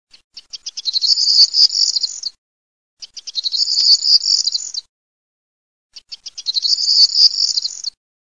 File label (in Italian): STRILLOZZO